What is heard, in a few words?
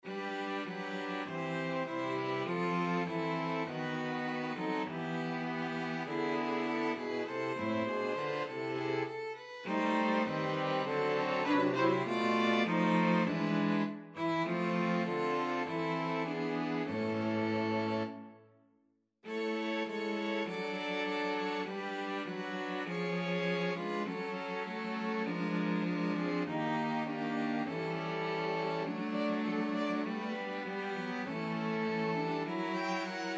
string quartet; SEMMEA Composition Competition